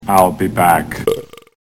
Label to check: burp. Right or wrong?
Right